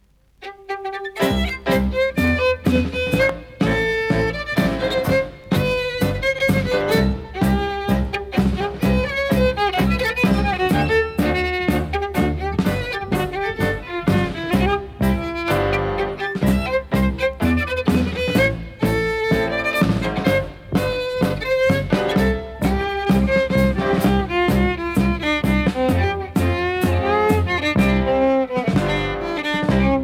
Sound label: tango